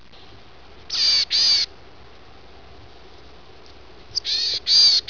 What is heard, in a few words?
boreal chickadee